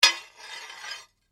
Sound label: Blade Heavy Moving Impact, Sword; Foley sounds for melee weapons; Swords (Sound Effects:Combat)